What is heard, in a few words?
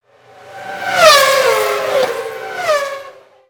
Car Passby Pitch Up